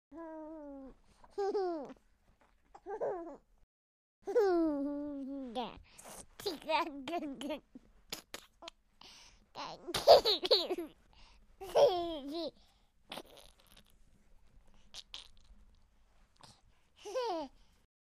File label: Single Baby Giggles